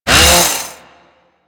Ghoul Sawman Melee Swing; Warframe; Grineer Ghouls

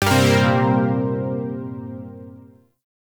Shawzin Blues Chord K; Music; Warframe; Zariman Shawzin

